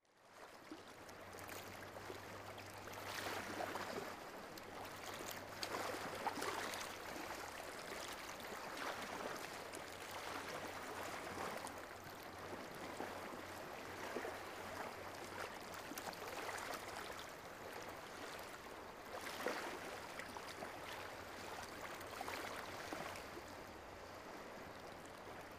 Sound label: Small waves; sea